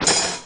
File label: metal lid